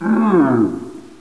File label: imp hit; eqclassic